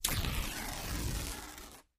Heavy fabric is torn into strips. Tear, Fabric Rip, Fabric